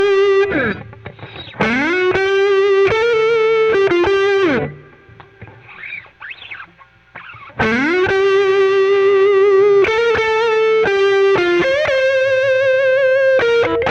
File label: Solo Gtr